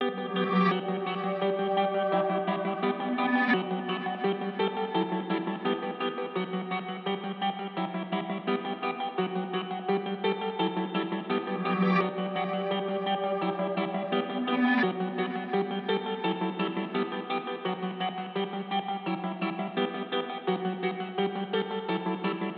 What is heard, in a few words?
dark guitar melody